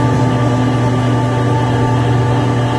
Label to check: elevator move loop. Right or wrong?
Right